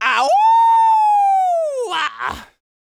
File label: YELL, MALE HUMAN; ILIO; Vocal Planet